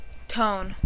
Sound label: tone